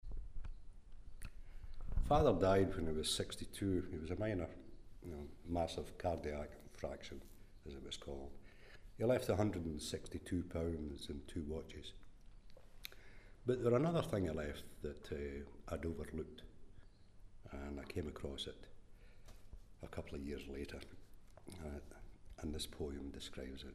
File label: the brass reel